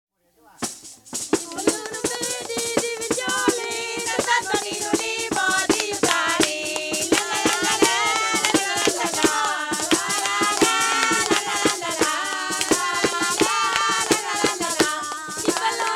TARANTELLA; calabria

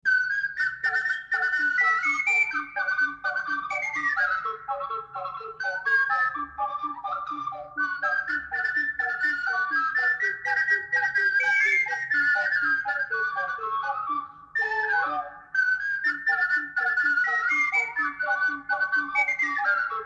GALOPPA OCARINE

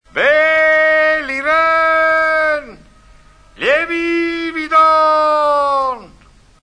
grido del pescivendolo

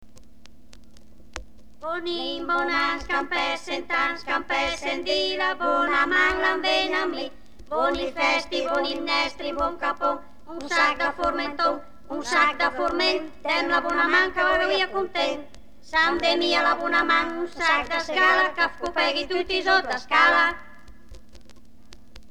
canto di capodanno; IL RITO E LA FESTA; BUNIN BUNAN

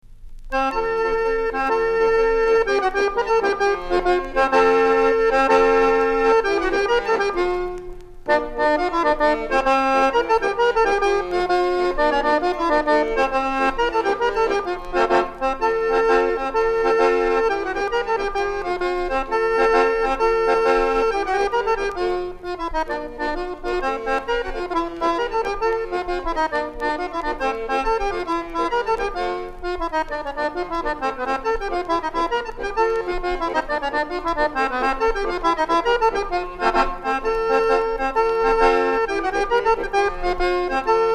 monferrina

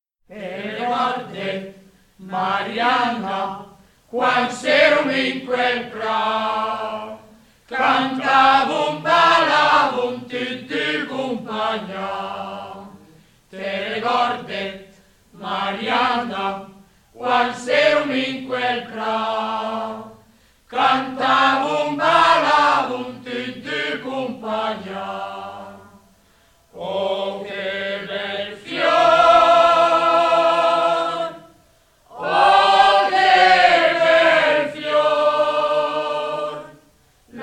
te ricordet, Mariana (coro); brianza

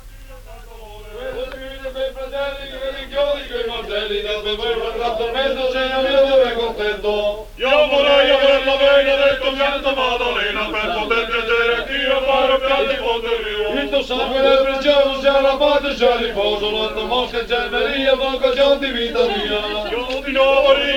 questua pasquale; basso veneto

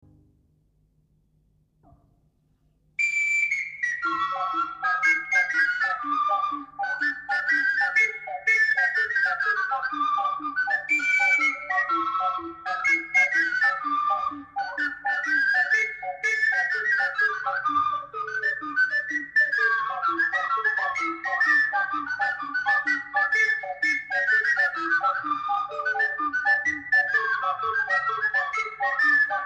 manfrina ocarine

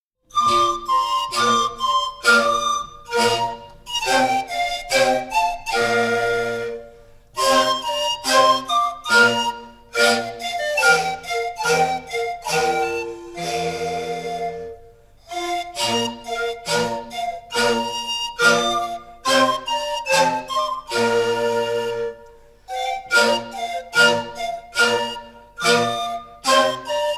flauti di pan; dove te vvet o Mariettina; BRIANZA PRIMA PARTE - CANTE, CONTE, CORI, FILASTROCCHE E BANDE DI FIRLINFEU

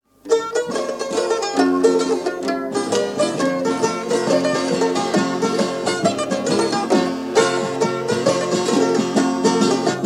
tarantella mandolino e chitarra battente; calabria

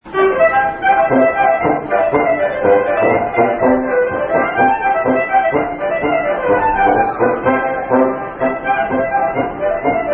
bergamasco (clarino fisa tuba); BALLI SALTATI E NON DALL'APPENNINO BOLOGNESE

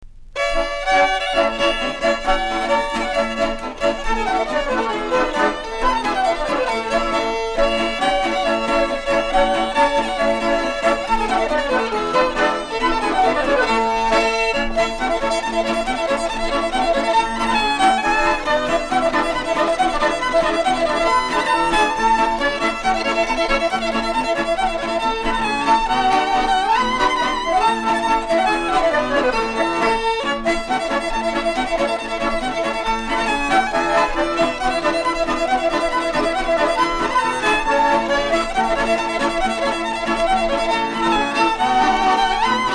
passo doppio alla francese

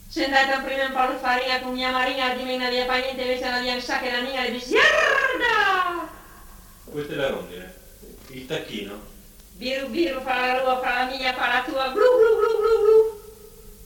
richiami rondine e tacchino; cuneo

